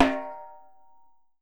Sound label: SOPRANO, Brazil Drum Perc; E-MU Producer Series Vol. 7 – Old World Instruments